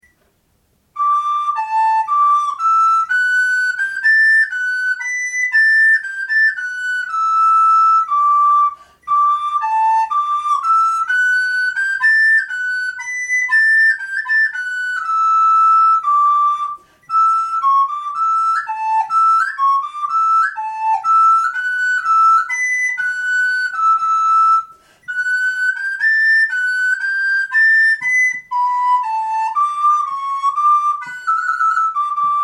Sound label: flute